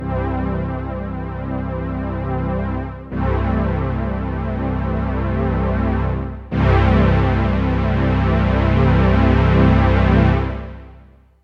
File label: Casio XW-G1 synth; synthsyr